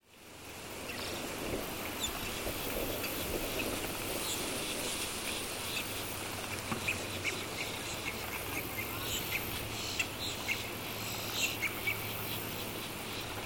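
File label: forest ambience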